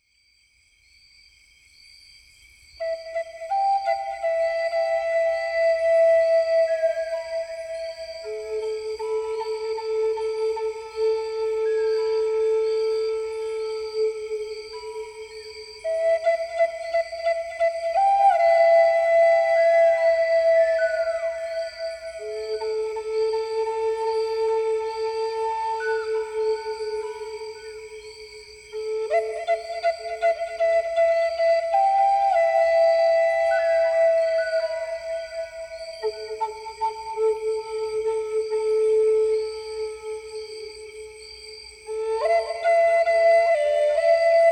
Dusk Brings the Crickets to Life, Native American Flutes & Sounds of Nature